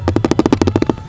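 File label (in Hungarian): ronda hang, Peugeot 207; totalcar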